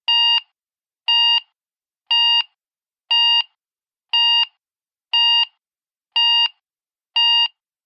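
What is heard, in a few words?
snoozer, alcatel phone sounds; Рингтоны, звуки будильника, уведомлений и системные сигналы смартфона Alcatel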